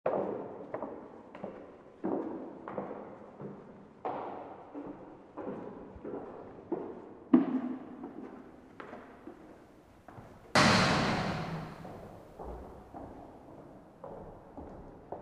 gaol; armagh

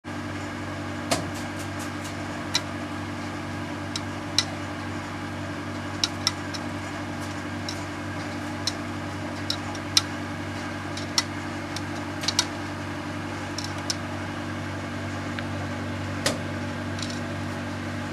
laundrette

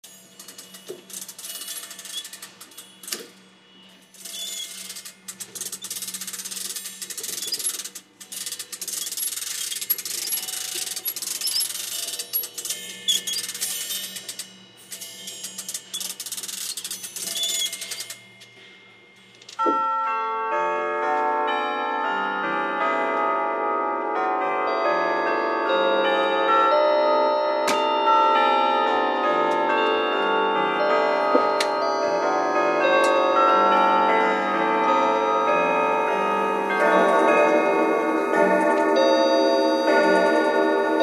clocks; hawthornes

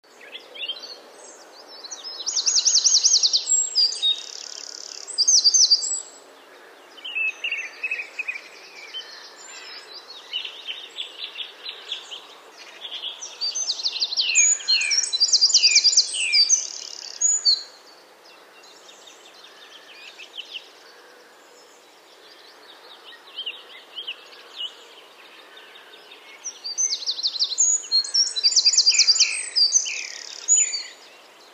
dawn; st lukes

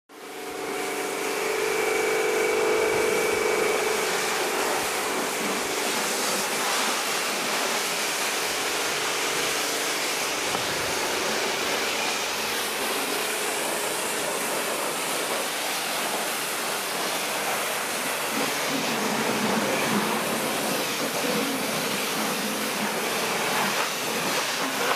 car valet

